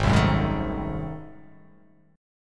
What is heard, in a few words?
G sharp 7th Flat 9th G sharp down, chord